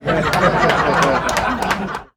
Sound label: Crowd Laughing; Vocals & Wallas; 1001 Sound Effects